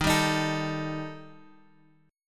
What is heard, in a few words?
Eb Major Flat 5th Eb down Guitar Open G